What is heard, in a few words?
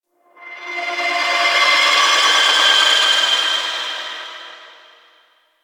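Drifting Texture; Warframe; Ambience; Entrati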